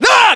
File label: melee attack; ModernWarfare; localized english